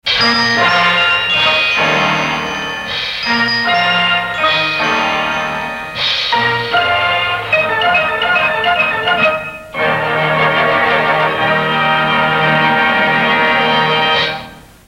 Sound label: fanfare; Big Broadcast 1932